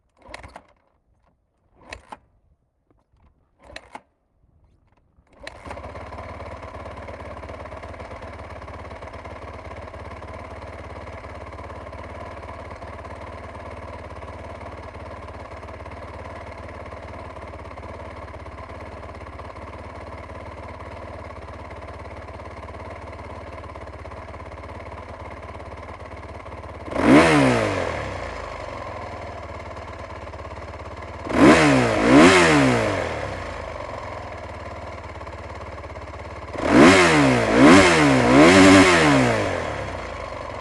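honda 450r onboard start idle steady blips off engine mix; Sonniss GDC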